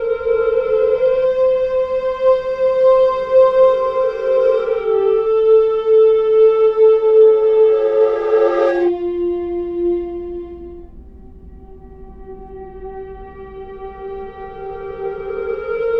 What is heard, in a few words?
Reverse Flute; Sonic Foundry (Sony Creative Software); Ma Ja Le Ethereal Textures; Flutes